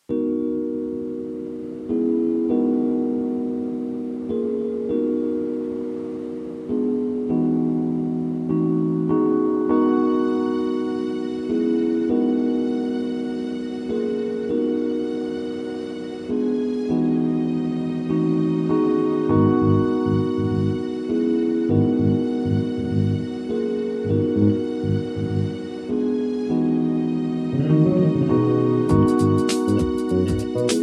smooth jazz cafe

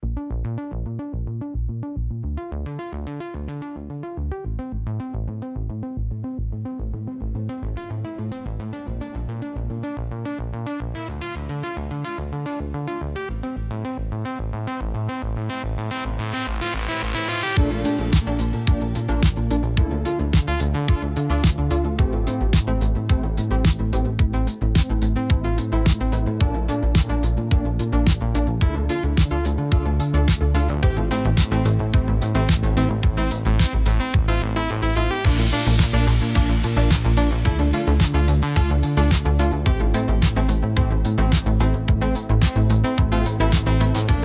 Electronic Impulse

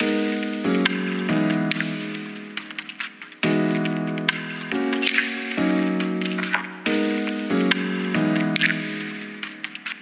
Groovy Chill Funk

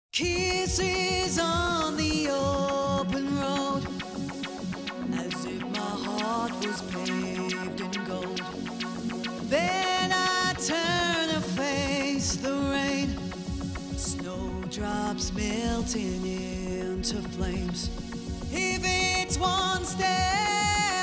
vocal chill 432 Hz

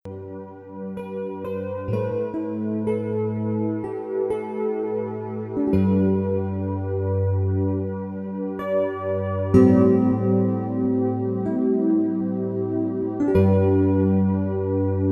music of the paradise, 432 hz; niebo z aniolami; SPA Music

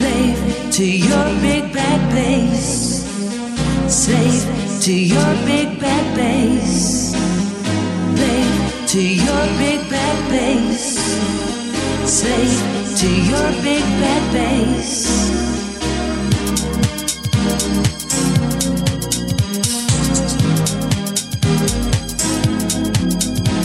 happy music 432 HZ

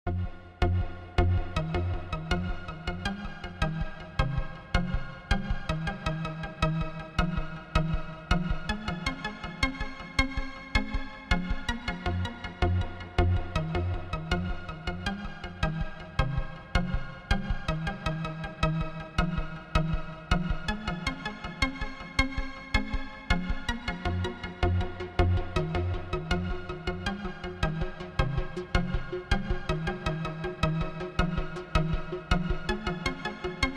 chillout music, 432 Hz; music in action

